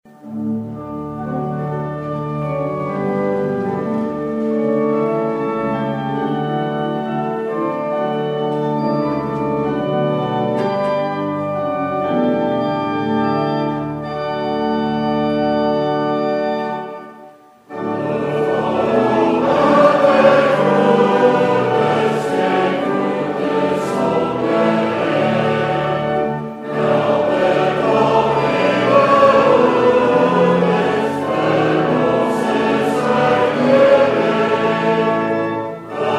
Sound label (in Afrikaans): Gemeentesang